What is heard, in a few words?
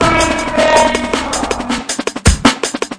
160 drum n bass robot vocal break; 141 to 160 bpm